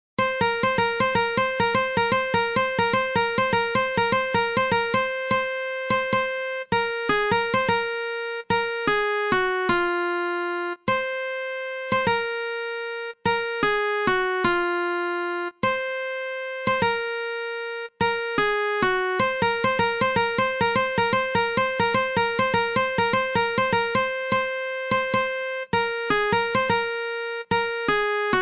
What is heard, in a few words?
Guitar